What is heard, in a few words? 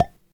pipe Hit; World Of Goo